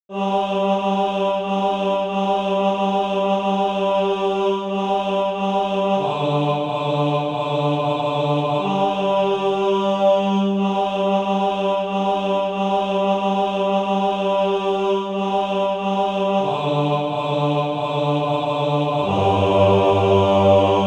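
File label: bass, SATB Practise Music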